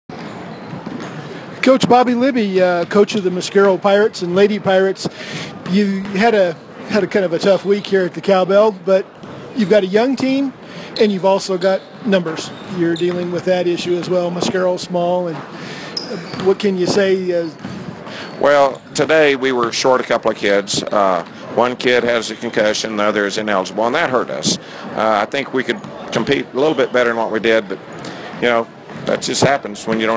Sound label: Cowbell; Mosquero